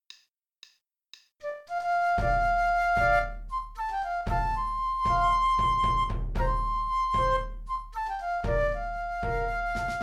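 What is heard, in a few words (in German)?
Becken, 115 bpm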